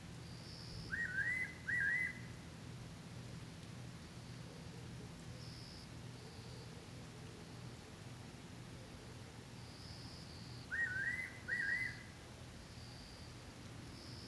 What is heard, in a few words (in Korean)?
밤 팔색조; 제주밤-팔색조; 조 한마리가 외롭게 우네요.; 낮에는 두마리가 서로 노랠 주고 받았는데 둘이 싸웠는지 밤엔 혼자우네요; 밤에 우는 팔색조 소리는 처음 듣습니다.